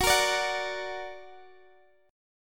F sharp Augmented F sharp Guitar Standard; x,x,16,15,15,14; strummed; capo=2

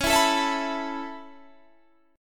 A Major Add 9th C sharp; Aadd9/C# strummed

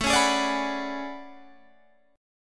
A Major 13th, down Guitar Half Step Down

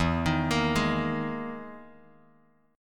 E-Major Sharp 11th E, Bass Standard; EM#11 arpeggiated; JGuitar

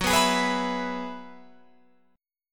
F sharp 9th Suspended 4th, down, Guitar, Open F; F#9sus4 strummed; chord shape

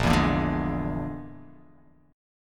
Bb 7th Flat 5th Bb; Bb7b5; strummed; JGuitar

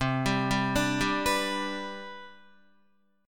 C Major 7th Suspended 2nd, C, down, Guitar; arpeggiated; CM7sus2

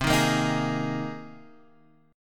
C Minor 7th Sharp 5th C, chord; Cm7#5 strummed; JGuitar

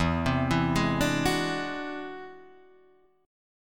E Augmented 9th E down Guitar Open D

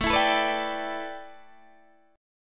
A 9th Suspended 4th Guitar Open D, chord; 19,19,19,17,17,17

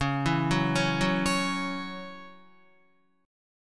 Db Minor Double Flat 5th Db Guitar; arpeggiated; Dbmbb5; JGuitar

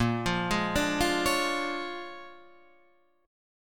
Bb 7th Sharp 9th Bb, Guitar Half Step Down

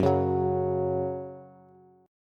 Gb 5th Gb down Bass; Gb5 strummed; JGuitar

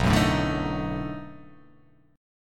B 7th Sharp 9th, down

